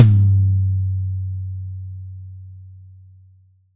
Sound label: DRY KIT, Drums; Real Mega Drums